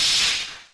mesh; ambient; Shadow Man

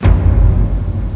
thump; Quake; PainKeep

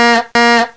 horn

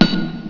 SWORD; gates

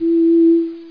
Sound Flute E; RenderOrgan

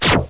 Shoot alien special; Bedlam 2

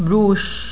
brush; Elementary Multilanguage Dictionary

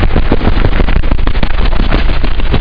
wings; Greatest Stories; fairy

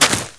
dirt, footstep

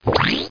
checkbox; Screen Gear 3.2.3; Screen Gear Pro

